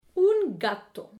chat

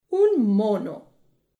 singe

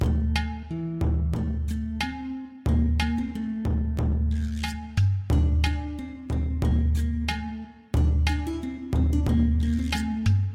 musique

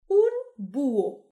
hibou

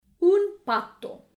canard

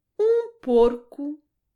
cochon

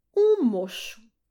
hibou

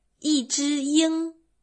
aigle

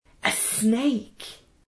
serpent

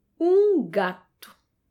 chat

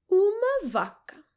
vache

